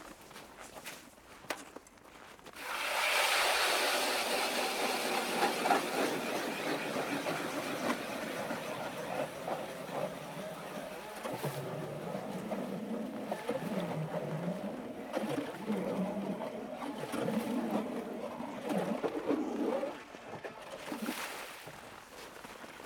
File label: Ice Drill, Ice Fishing; The Ways - Ice Fishing; Nat Sound Selects